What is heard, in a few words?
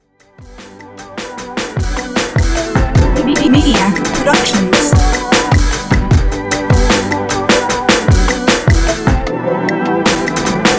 Clocking In